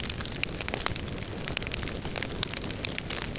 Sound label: fire, ambience